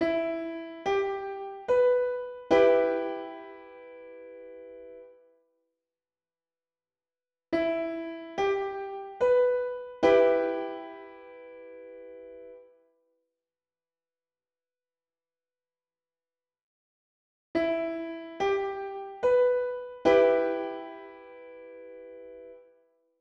Triad Audio

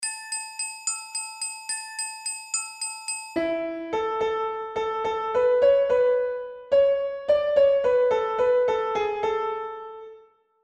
melodic dictation

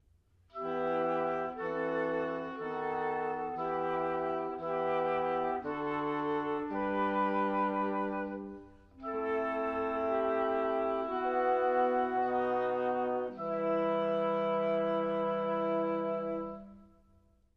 Harmonic Dictation

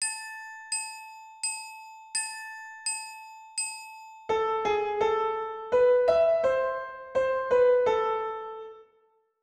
Melody 3-4 A Minor

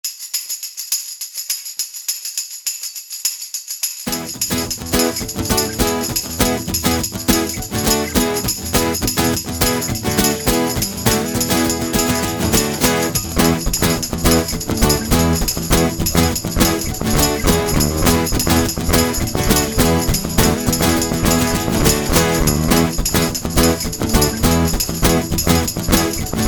music

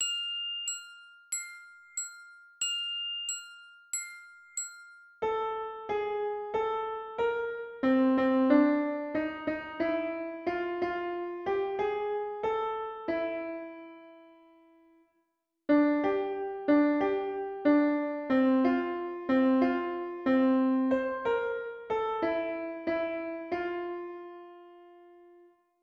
Melodic Dictation

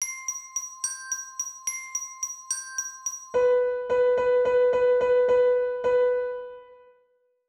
Rhythm 6 8

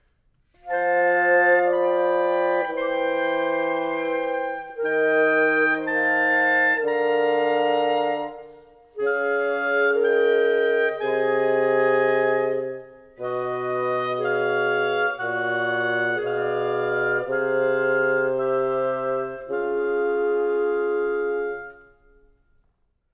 Harmonic Dictation

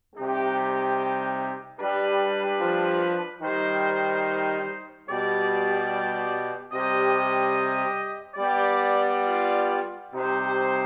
Harmonic Dictation

